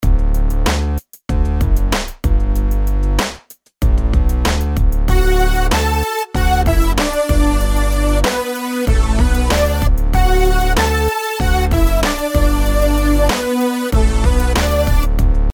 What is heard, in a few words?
melodies simple Dmaj; melody